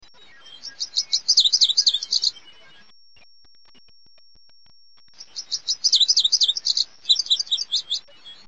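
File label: Lazuli Bunting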